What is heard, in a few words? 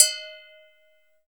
GOGO LO, AGOGOS; Drums In Your FACE!